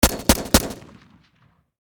Warfare Machine Gun Sig Sg 552 Commando Triple Shot Close Perspective; SFX Bible 6